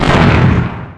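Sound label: starburst; The Rift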